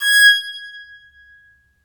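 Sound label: bowed psaltery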